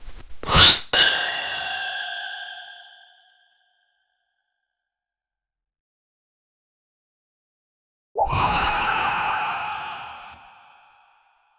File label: abrupt end of dream not